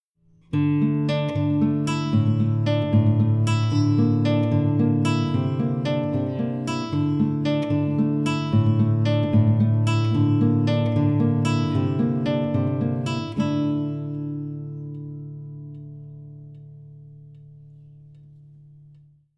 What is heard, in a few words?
Right Hand, guitar